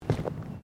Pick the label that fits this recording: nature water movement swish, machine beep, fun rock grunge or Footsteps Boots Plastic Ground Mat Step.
Footsteps Boots Plastic Ground Mat Step